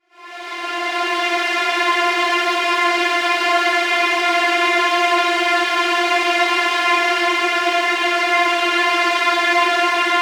F danger pad; M8

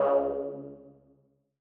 Lead C#2; KG MegaSaur

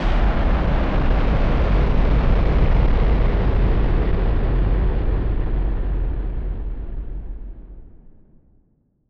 Noise Bomb Rumbler; musicradar; bomb factory

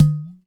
Udu Low High Pop; Sonic Foundry (Sony Creative Software); Joe Vitale Latin Percussion

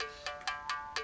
STR Mouth Bow Phr; Roland LCDP11 Africa VOL-1; S.MouthB Phr

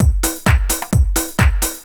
drum 130 dance, drumloops

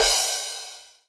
Crash; Equipped Music; Loungin House

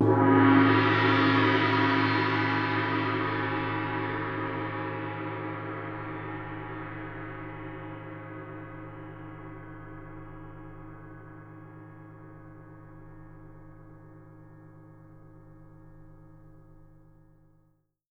GONG, Percussion; Miroslav Vitous - Percussion & Harp

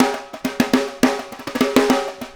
Snare Pattern, Drums; Essential Percussion

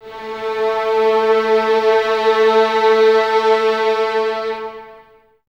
violins A 3, String Ensembles, 23 Violins; Miroslav Vitous

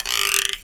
Reco Reco Long, Guiro, Percussion; Roland BK-5

